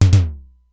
Simmons SDS-9, drum machine; Factory C5

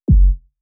Sub Kick

